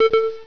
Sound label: alarm double quiet; WoW Wotlk; GTFO